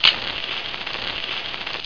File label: Light The Fuse; BOOM